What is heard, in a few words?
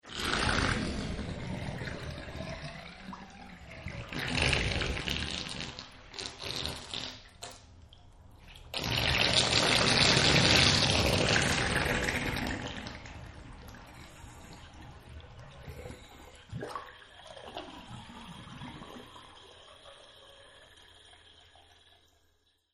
Bathtub Drain; Bathroom; Household; Partners In Rhyme